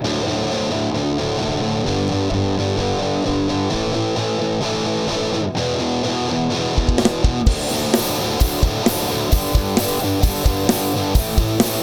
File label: rock and roll